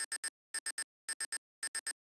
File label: Warning Beep; Sci Fi; 1001 Sound Effects